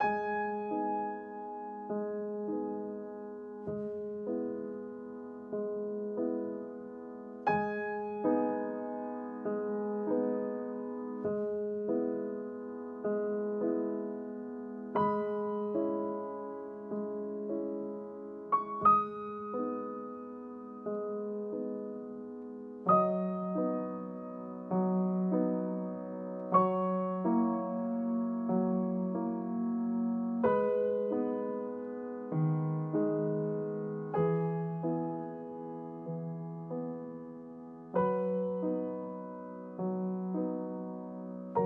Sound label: Hospital Music; Hospital Music Real; that dragon cancer